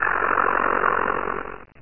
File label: pain; mystic radar; mr zombie1